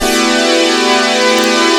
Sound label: syncord, Emu Sp12; Drums